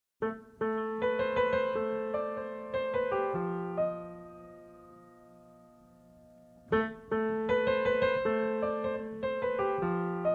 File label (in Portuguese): caixinha de musica instrumental